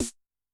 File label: analog, Snare; Yamaha DTXpress-II